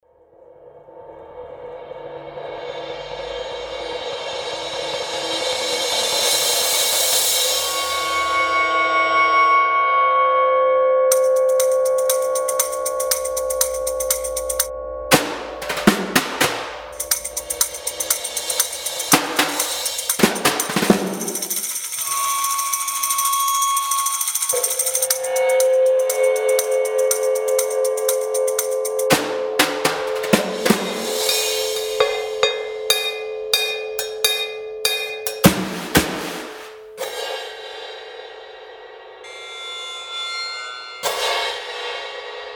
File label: ambiences